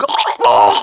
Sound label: death, voiceovers; ModernWarfare; localized english